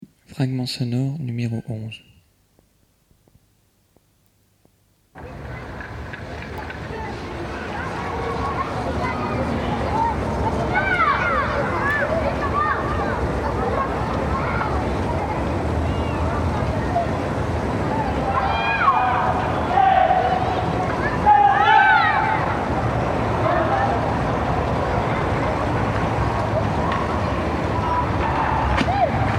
proxemie acoustique habitat cage escalier midi

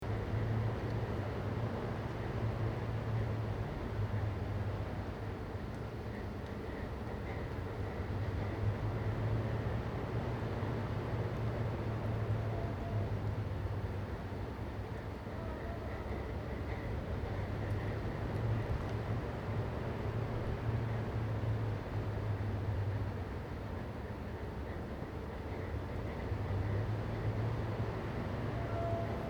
TGV montant

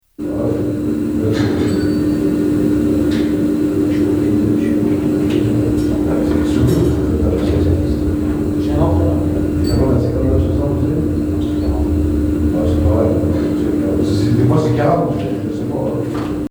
bourdon ventilation

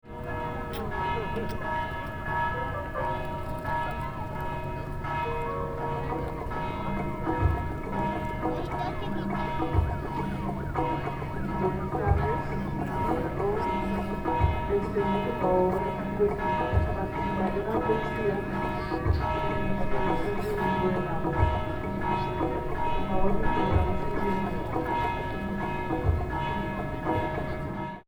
mixage sanctuario

